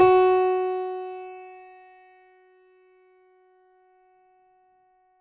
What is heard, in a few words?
mini grand; bananenkeyboard